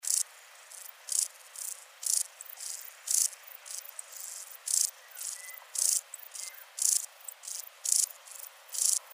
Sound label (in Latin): Euchorthippus declivus; BIOAC ORTH